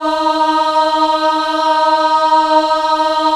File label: D#3 women ah, Choir; USB Soundscan vol.28; AKAI; WOMEN AHP